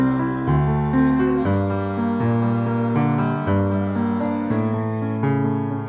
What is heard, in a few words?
Piano R